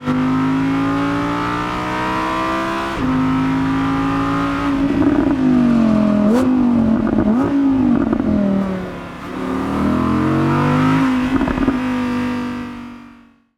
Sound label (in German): Audi R8 Coupe Rückschaltung; r8 v10 quattro; audi sport